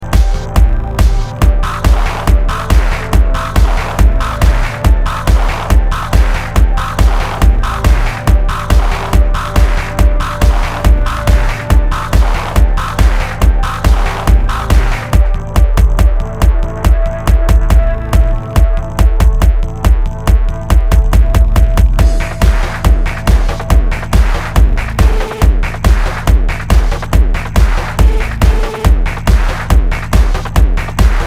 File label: industrial